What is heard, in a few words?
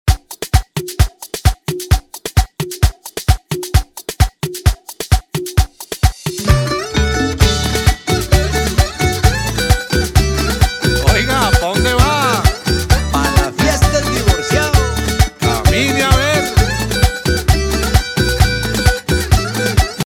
remix intro 131 BPM